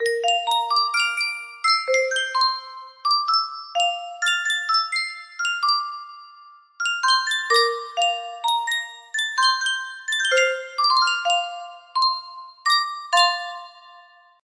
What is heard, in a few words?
Sankyo music box